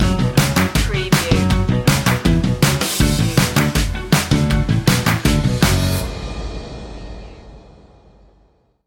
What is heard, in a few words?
funky hop stinger